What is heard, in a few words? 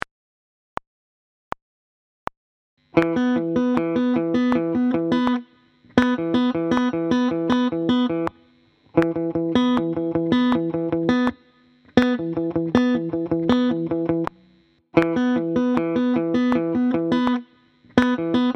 Hybrid Picking